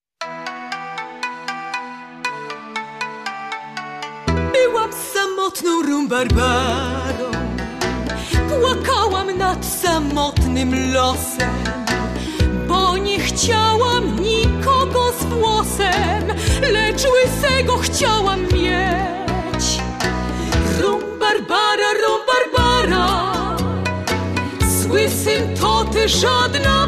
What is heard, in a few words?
rumba